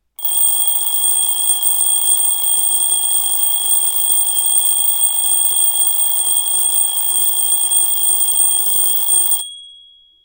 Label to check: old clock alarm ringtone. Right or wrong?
Right